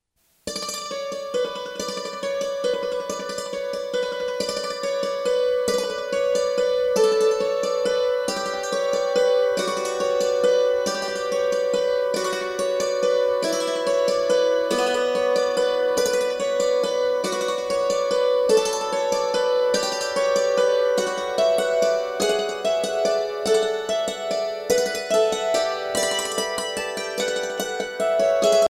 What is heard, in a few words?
carol bells